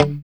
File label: POP D 3, STRAT guitar; Spectrasonics; Hans Zimmer Guitars VOL-1; JC-STRAT POP